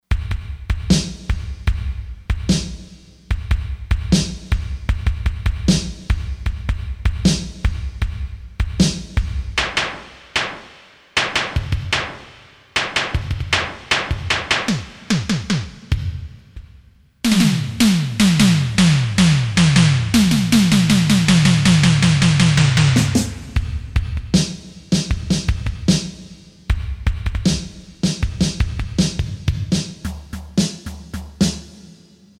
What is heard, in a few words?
SIMMONS, Percussion, Korg DSS-1